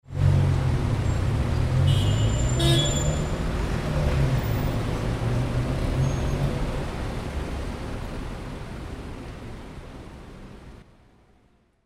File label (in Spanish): carro saliendo y pito